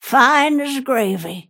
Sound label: last hit pain; snapfire